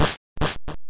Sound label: Door exit; Pokemon Essentials; PKMN Estelar 1.3